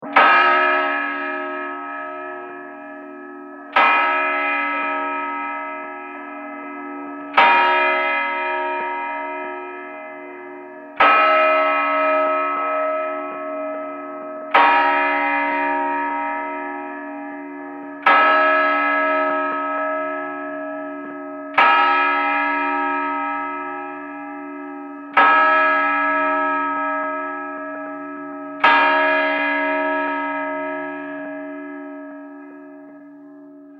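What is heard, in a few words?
Antique Railroad Crossing Bell; Railroad Xing Bell; Bell Sound Effects; Bells (Sound Effects:Musical)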